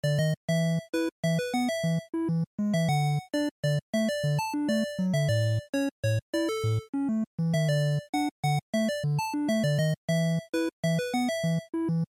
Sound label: General Stage Loop, bgm